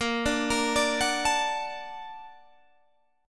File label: A sharp Augmented Major 7th A sharp Guitar Standard; arpeggiated; JGuitar